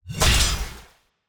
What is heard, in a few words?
Orbital Sword Gravygun Reaction; TANK; 3Souls